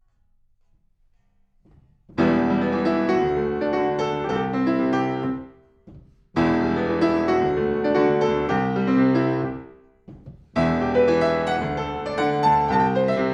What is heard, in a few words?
PIANO